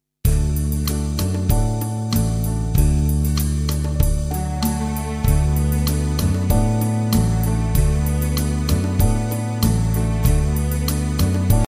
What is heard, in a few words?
CRYING